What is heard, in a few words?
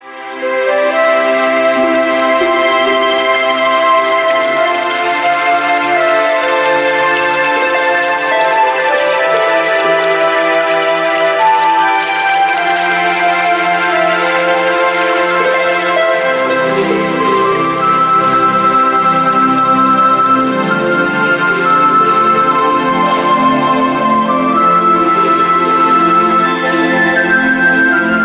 moon light tone; dzwonki na telefon